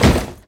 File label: wood impact; kfsounds; css